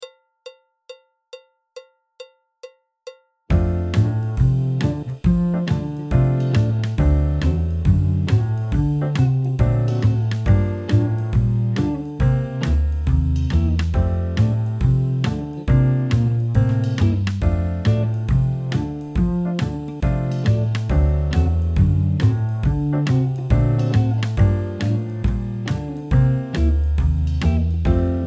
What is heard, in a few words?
trombone; Jazz Syllabus Level 3